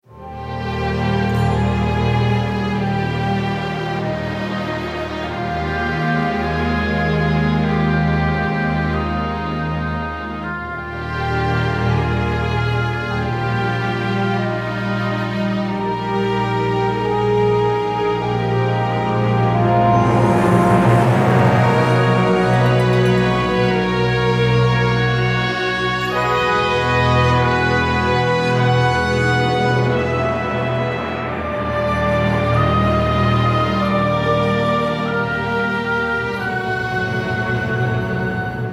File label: 96 bpm Eb, Free Music; OpenWorld